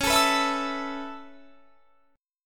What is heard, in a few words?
C sharp Minor 7th Sharp 5th C sharp; C#m7#5 strummed